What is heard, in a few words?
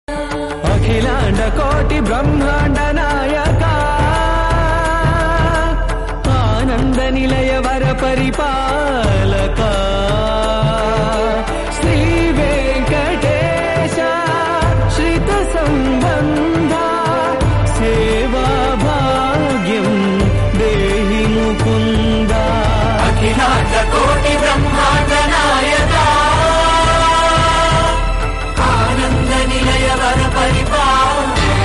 Telugu